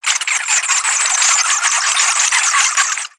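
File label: Pets Insect Large Rage; creatures; Lego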